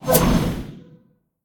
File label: projectile; smite; gilgamesh